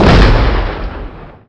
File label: sawed; outlaws